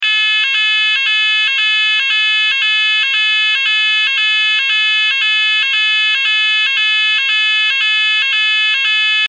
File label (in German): Ton